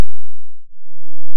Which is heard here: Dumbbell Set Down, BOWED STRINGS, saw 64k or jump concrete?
saw 64k